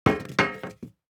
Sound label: DICE on Metal, Throw and Roll, Foam, 2 Two Dice; Samples; ArticulatedSounds; DICE Sfx Party (Extended)